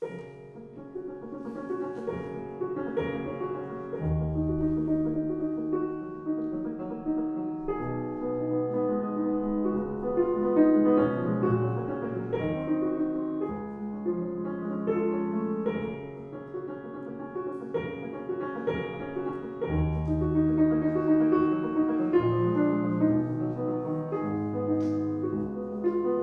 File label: Impromptu Noise; m4a 320kbps; Schubert